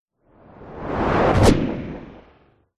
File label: transition whoosh fast; sound design; zapsplat